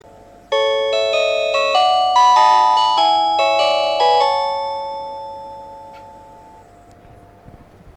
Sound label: chime; hokkaido